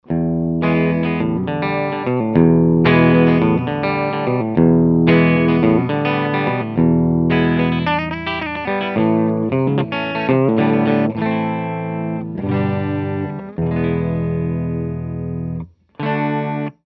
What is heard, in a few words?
clean single coil